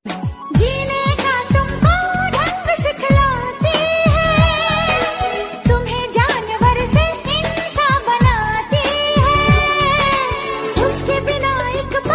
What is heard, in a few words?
romantic bollywood song ringtone